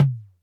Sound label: Toms, Elektron MachineDrum; SPS1 MKII